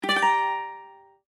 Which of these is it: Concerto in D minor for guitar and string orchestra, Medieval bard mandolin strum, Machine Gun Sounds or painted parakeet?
Medieval bard mandolin strum